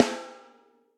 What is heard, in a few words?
Snare Rim Reso Classic; Drum Hits; Standard Hits; Ableton; Factory Packs; Session Drums Club